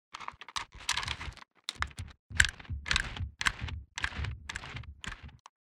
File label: movement walk; Ranger